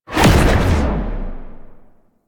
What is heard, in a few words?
Cloak Cast; Warframe; Warframes; Frumentarius